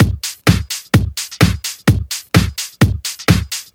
House Beat, 128 BPM

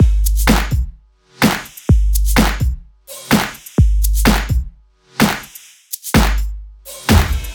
127BPM Kit; Vengeance Samples; Com Pop Kit

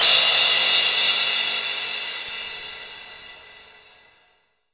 Crash, Cymbals; Vengeance Samples

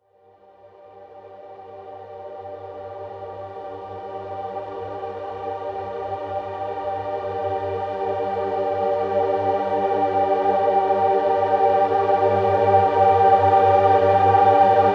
128BPM Nature G, Melody Fills; 8Bars; Vengeance Samples

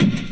kick; Breaks N Beats Sound Kit; DnB Kit 2

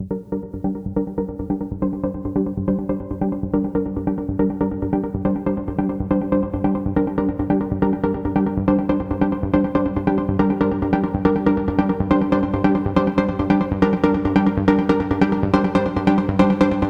Pluck Intro WET 140BPM, G minor; Unlooped; Vengeance Samples; VTS1 Devastating Truth Kit

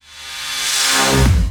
reverse, FX; Vengeance Samples

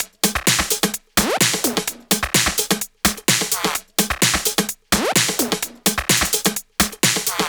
Electro Loop 128 BPM; Vengeance Samples

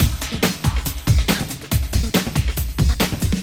Loops BB 140, BreakBeats; Vengeance Samples

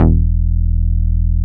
Bass Long E; Vengeance Samples; Long Basses

